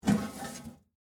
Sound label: Mail Box Open, Household, Mail, Box, Open, Metal; Miscellaneous Household Sound Effects